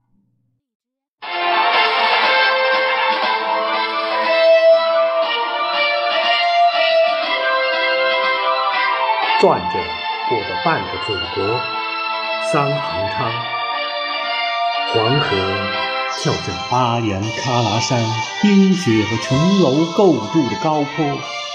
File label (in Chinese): 音乐